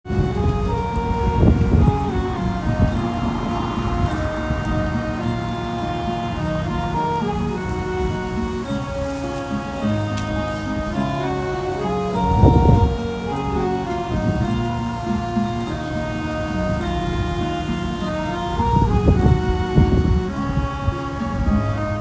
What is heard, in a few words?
music; Sri Chinmoy 47-mile race